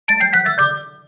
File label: Fairy Notification Ringtone